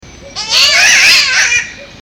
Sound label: spotted catbird